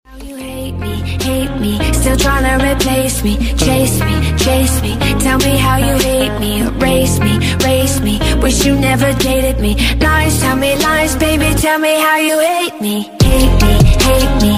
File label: for boys ringtone, english ringtones